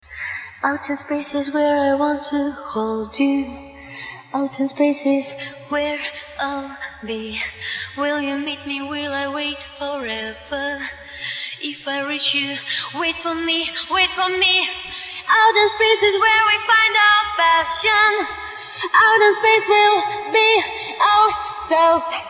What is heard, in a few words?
Filtered Acapella Snippet; fan made